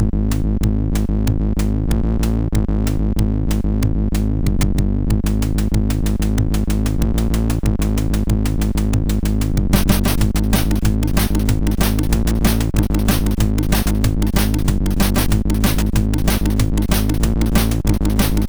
Hard Tekno, MOS6581R4

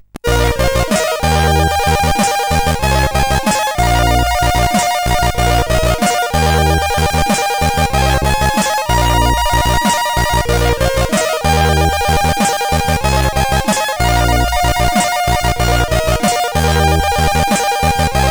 Steady, sid CSG8580R5

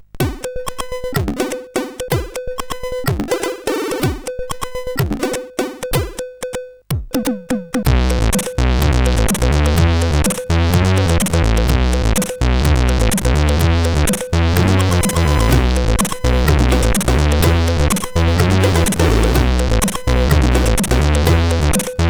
Dull Jazz, sid CSG8580R5

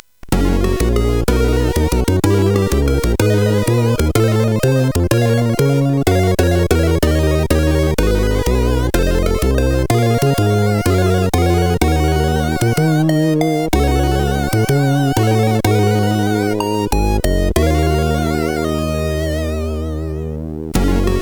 JAZZ, sid CSG8580R5

